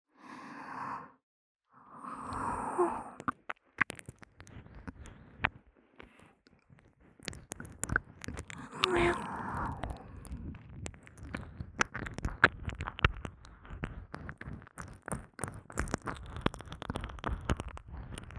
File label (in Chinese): (左)舔耳_不断舔弄; 仅仅是沉溺于舔舐声中; 舔舐声特化